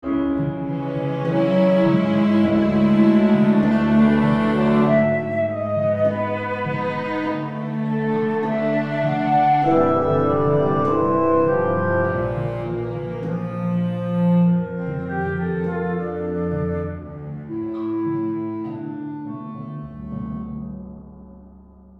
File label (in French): dessin animé